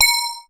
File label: BELL-GONG, A#; Big Fish Audio